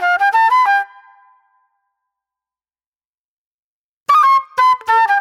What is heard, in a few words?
J-Funk Flute, Call And Response